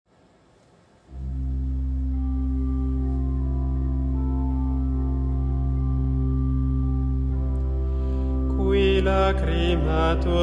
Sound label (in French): tierce office defunts latin; office des defunts